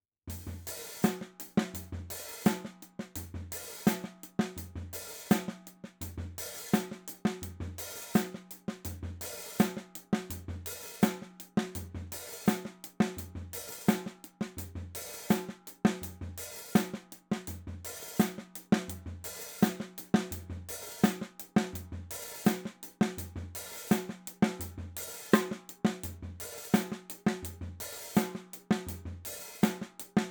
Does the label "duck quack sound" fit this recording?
No